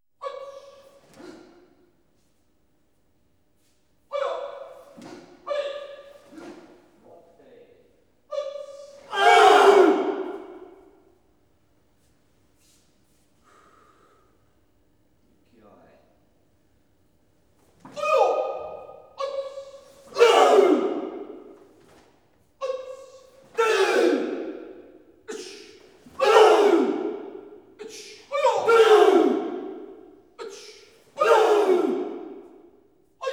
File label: Karate Class, Approaching With Sh; BBC Sound Effects Library